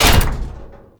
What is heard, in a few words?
loot locker open; Warframe; Tau